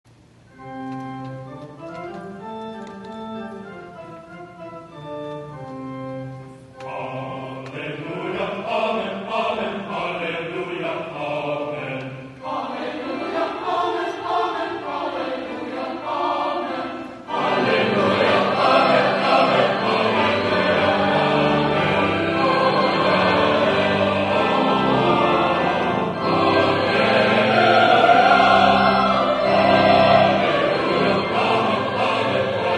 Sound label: Hallelujah Amen